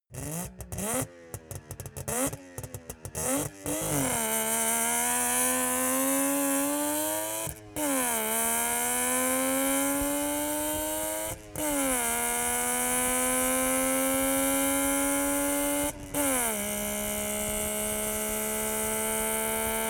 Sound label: car saab 92 rev drive exhaust